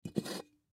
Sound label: Pot Pick Up, Pot Pick Up Wood Table; Household; Pots and Pans; Restaurants